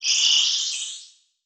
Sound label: Multimedia Internet CD-Rom Flash Animation